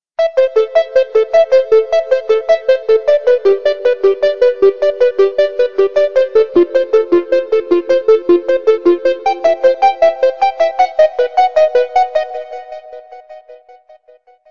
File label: Clear Tones; iPhone